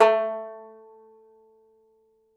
Tenor Banjo

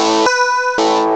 fuzz guitar; GUITARS1

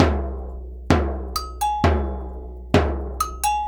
130 AGOGO, Percussion Loops; USB Soundscan vol.36; AKAI; 130COMBI

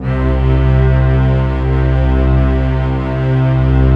huge stack; Greytsounds; Scott Peers Sound Engineering

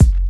BD; Best Service; Dance Mega Drums

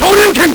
LSDj, Nintendo Gameboy; 300 Drum Machines

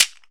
PRC WOLOF, Afro Shakers

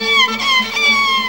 VIO MISC, MISC VIOLINS

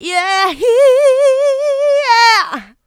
Vocals, Adlibs; Houseworx

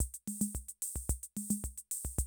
CR78, DrumLoops

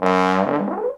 TROM DOIKS

